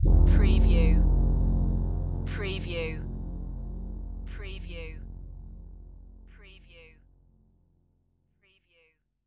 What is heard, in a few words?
Under the Surface